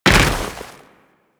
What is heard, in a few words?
Moa Step Snow, footsteps; Enemies; Warframe